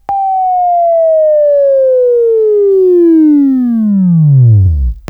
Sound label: WAV Left, Sweeping